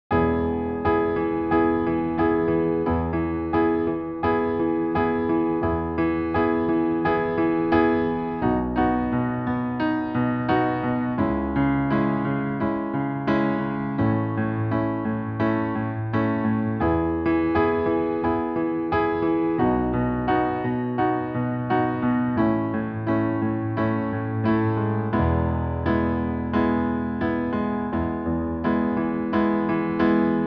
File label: riff audition